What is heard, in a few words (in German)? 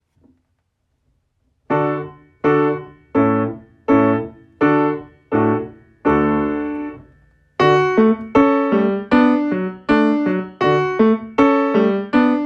Klavier